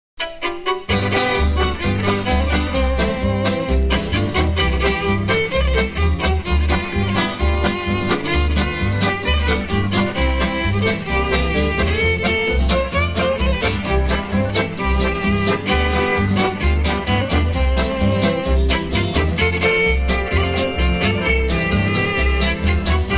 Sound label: instrumental